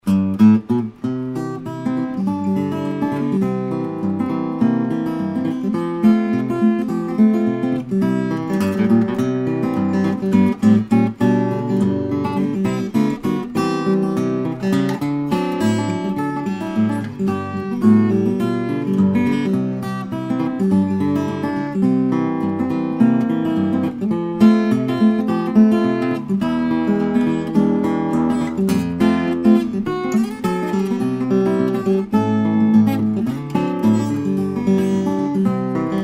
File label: martin 00-28, steel string guitars flattop; small body parlor